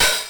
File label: hihat